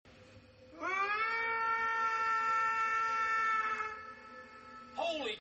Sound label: squatch call